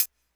hihat, Hardcore Breakbeat; Big Fish Audio; Hardcore Breakbeat Action; Rhythms 4 Life 151 Ebmin

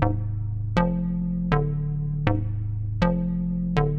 SH101 Bass Loop (120BPM), retro house

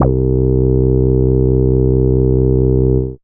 Dance Synths, Moog Bass

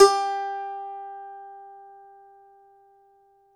DOMRA, Russian Strings; E-MU Producer Series Vol. 7 – Old World Instruments

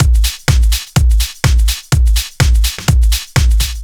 Drum Loops, 125 BPM

